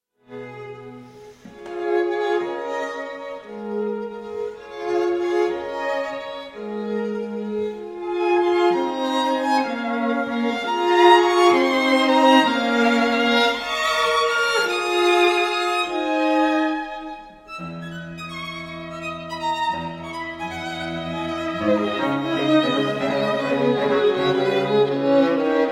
string quartet no 2; doric string quartet

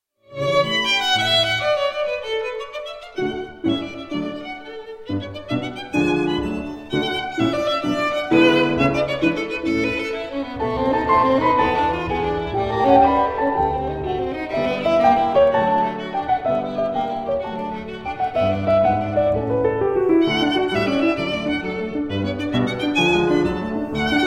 sonata for violin and piano 3rd movement

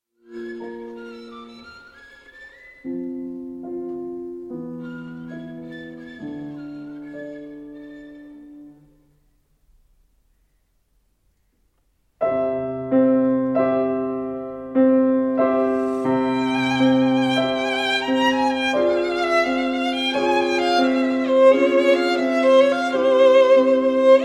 romanian folk dances for violin and piano; bla bartk; sz 56 arr szekely

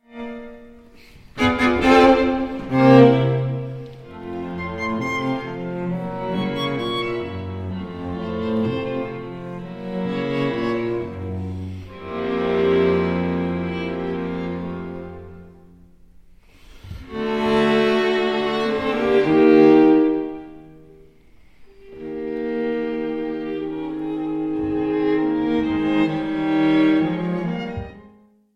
string trio fragment in E flat major; doric string quartet; franz schubert; d471

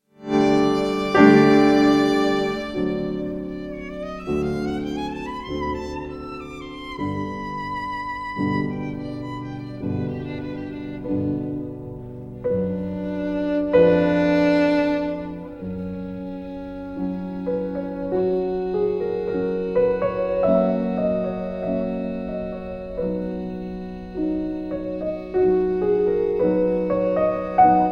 sonata in g major op 96 for violin; ludwig van beethoven

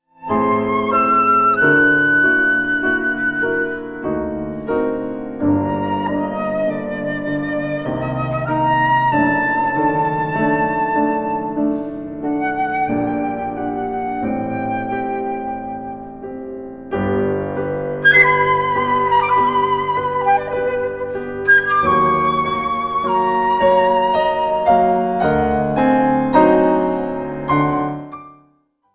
flute sonata; poulenc

